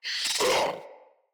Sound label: Infested Charger Alert